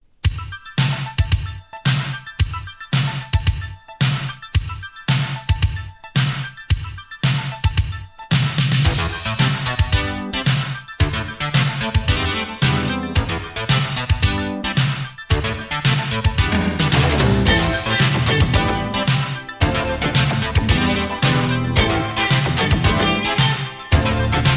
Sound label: Female J Pop Boogie Funk